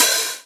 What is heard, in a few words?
Open Hihats, Cymbals; Vengeance Samples